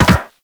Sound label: Land Soft Metal, Footsteps; Characters; Operator